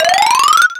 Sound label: Pipe Appear; SM3DW